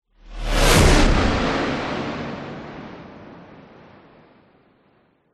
science fiction car vehicle drive zoom past engine rev sonic boom pass